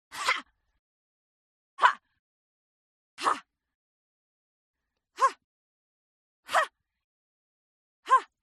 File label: HUMAN VOCAL Female Attack "Hah"; smartsound